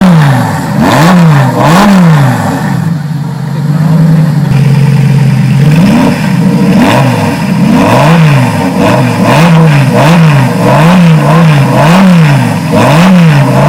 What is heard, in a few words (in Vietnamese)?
Tieng xe Lamborghini